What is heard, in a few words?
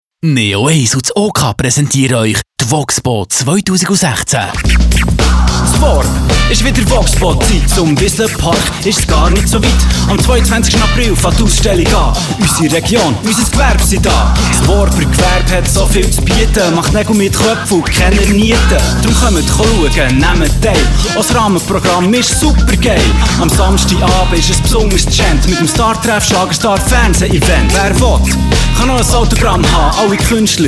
Rap; WOXPO 2016